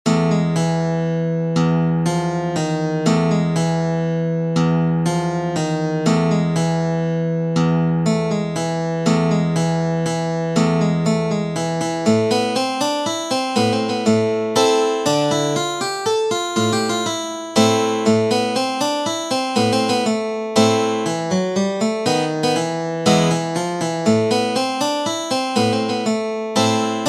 Mazurka, guitar; Chopin

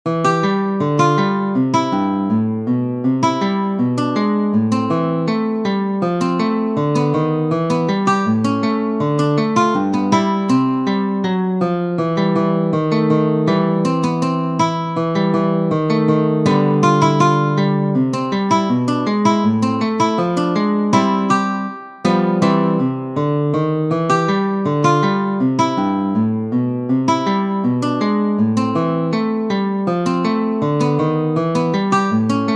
ragtime

